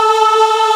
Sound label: Aahh Chor, VOICE; Best Service Gigapack 1